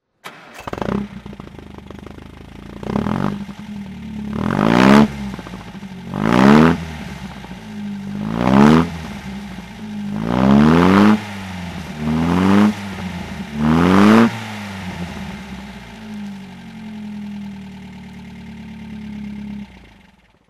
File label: MG TA tickford throttle